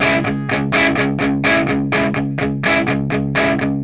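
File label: 125, Vintage Blues Guitar rhythm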